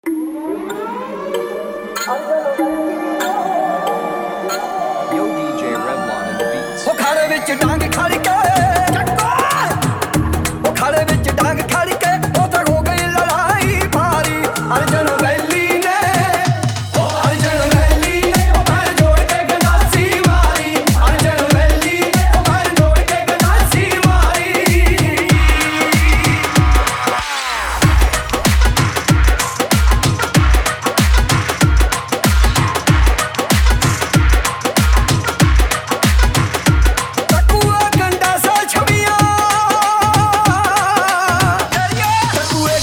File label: Dhol Remix; Animal Movie